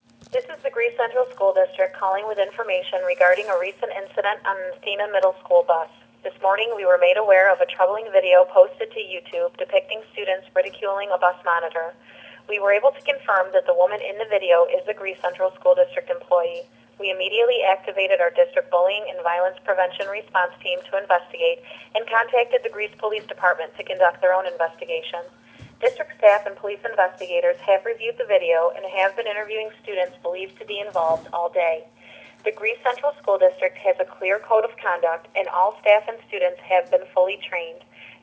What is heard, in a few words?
phone blast